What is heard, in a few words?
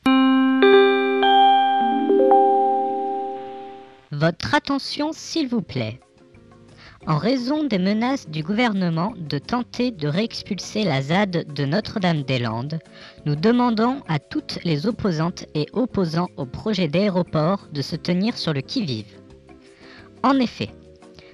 jingle; zad sncf